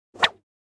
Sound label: swish single; Asian Show Sound Effects